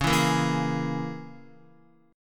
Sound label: Db Minor 6th Db down Guitar; Dbm6 strummed; JGuitar